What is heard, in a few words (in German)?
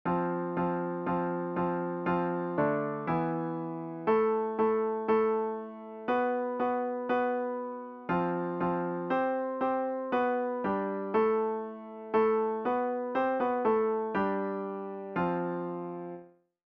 Sommerlied lydisch; Island